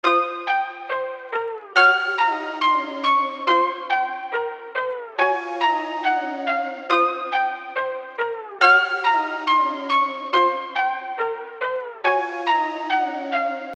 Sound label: Melody Loop